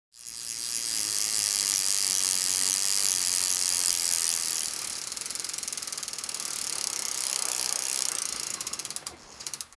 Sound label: riding a bike sound, outdoor activities